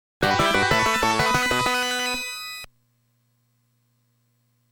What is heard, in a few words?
Stage Clear; Universal Soldier